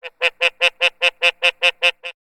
Exterior Random Critter; moul-assets

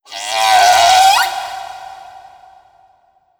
Critter Female Verb; Kahlo; khlo

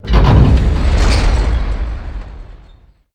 Pillar Up

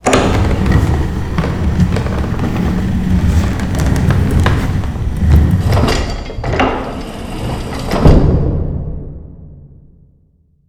Secret Door Close

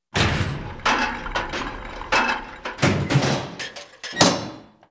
Air Shaft Ladder up

